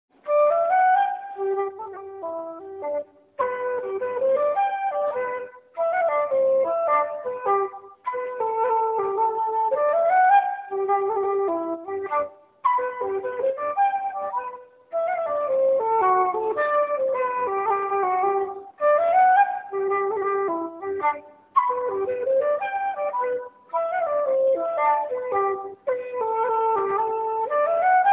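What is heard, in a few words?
Hornpipe Live, Traditional Irish Music; Cooleys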